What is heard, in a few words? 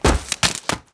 deadfall stone, foley